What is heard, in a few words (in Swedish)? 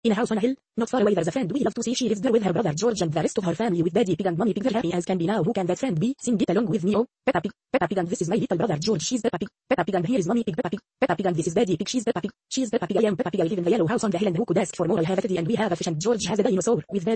rap arabiska; peppa pig